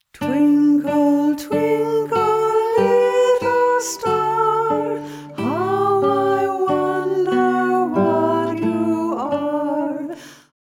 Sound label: Twinkle Star